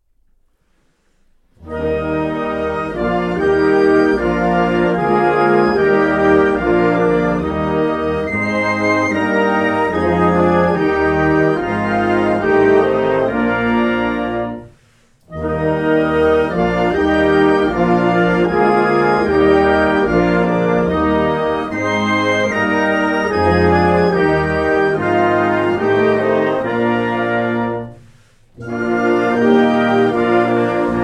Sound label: german anthem